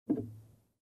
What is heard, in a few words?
Noise from outside the car